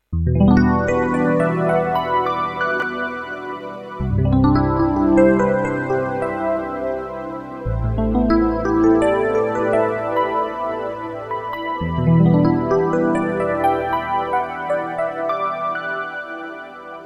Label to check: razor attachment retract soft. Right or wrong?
Wrong